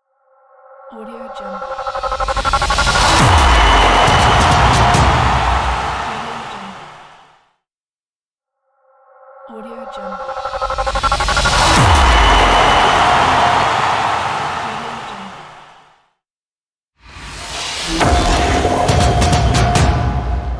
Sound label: football logo